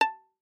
Violin pizz ff sul E B5; MIS Pitches - 2014; Strings

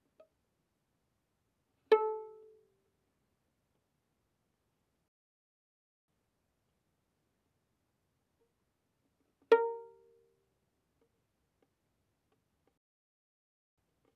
Violin pizz mf sul D A4 G5

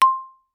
Xylophone hard rubber ff C6 stereo; MIS Pitches - 2014; Percussion

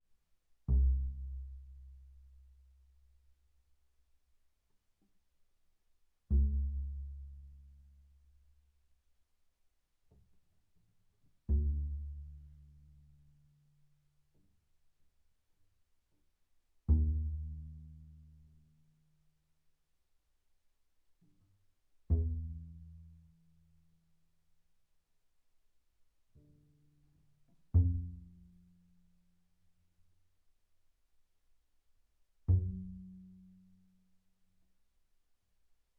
Bass pizz sul E mf C2 B2, double bass

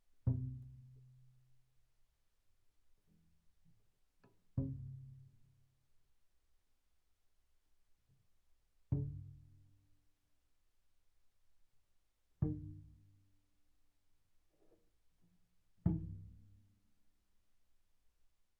Bass pizz sul E mf C3 E3, double bass

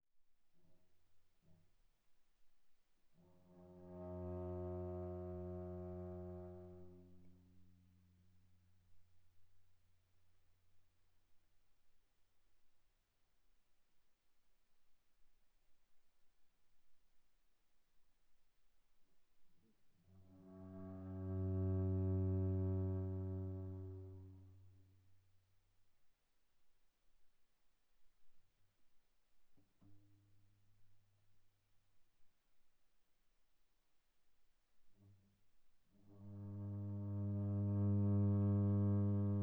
Viola arco sul G pp G3 B3 stereo

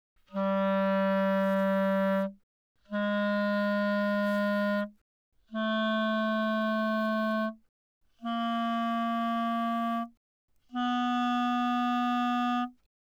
Eb Clar ff G3 B3, Eb clarinet, woodwinds

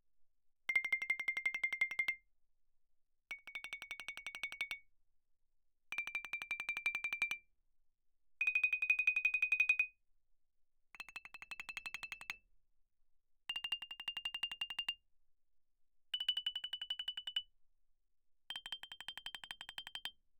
xylophone hard rubber roll ff C7 B7; Percussion

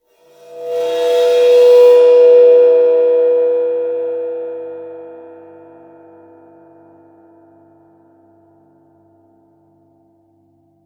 ride bow, Suspended Cymbals bowed; Percussion

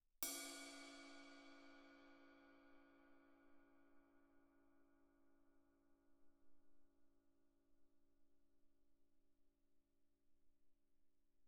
crash stick normal mf, suspended cymbals; Percussion

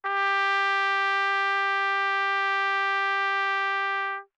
Trumpet novib ff G4 stereo, Bb Trumpet; MIS Pitches - 2014; Brass

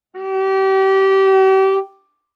Viola arco ff sul C G4 stereo; MIS Pitches - 2014; Strings

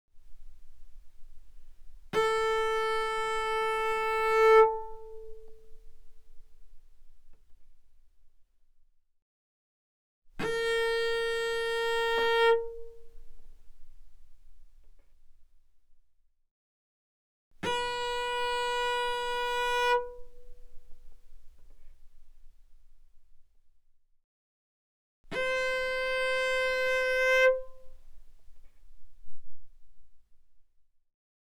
Cello arco ff sul A A4 A5; mono; Strings

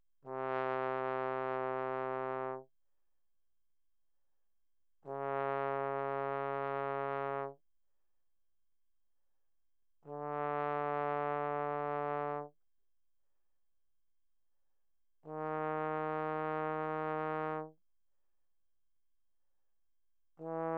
Bass Trombone, mf, C3 B3; Brass

